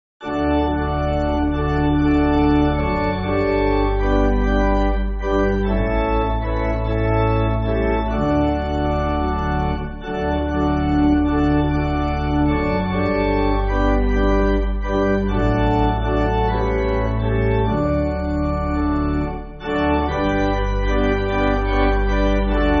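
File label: Pipe Organ, Free Music Backing Files for Churches; Come Jehovah Our Lord; Bradbury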